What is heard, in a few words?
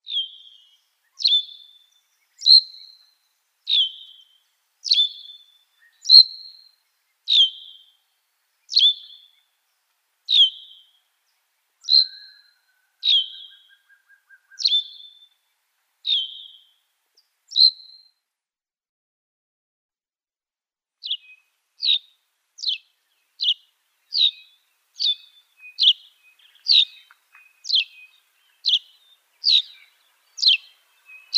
Long-billed Pipit song